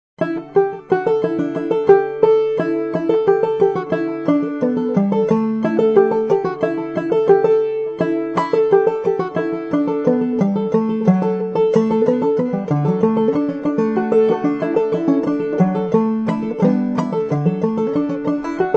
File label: D GDAE aDADE; banjo; mono; LovelyJane; MelvinWine; JimmyTriplett; Workshop